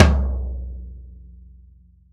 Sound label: velocity tom; VELOCITY TOM 1